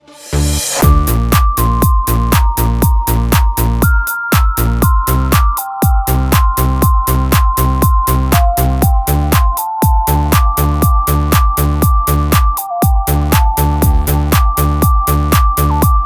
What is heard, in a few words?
ringtone